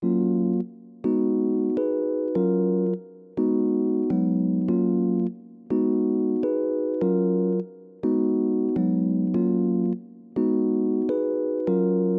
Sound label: 103 BPM F# Maj E Piano, Afrobeats; Melody; Loop Stems; Cymatics; Bahamas